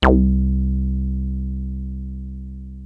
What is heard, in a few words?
303 C2, TB 303